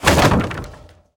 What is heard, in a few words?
skill wood build